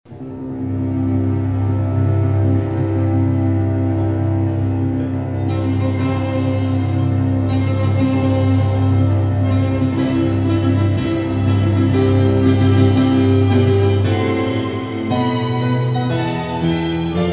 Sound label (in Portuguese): Marcha Nupcial Piano e Cordas